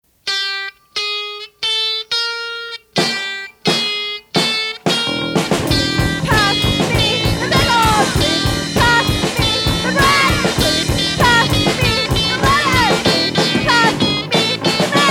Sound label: jazz hit